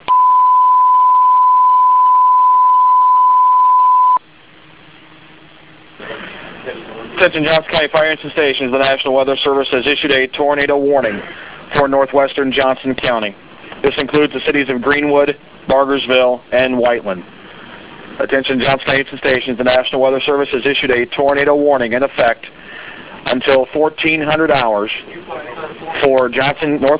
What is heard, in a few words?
Tornado Warning; news